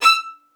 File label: E6, LOUD VIOLINS; Q Up Arts; Denny Jaeger Master Violin Library